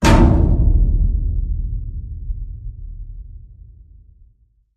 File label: Hit Kick The Can